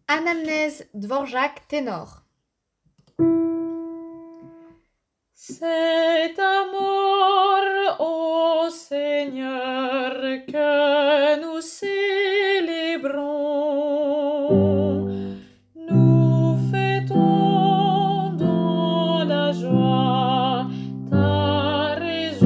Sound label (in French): Anamnese Dvorak tenor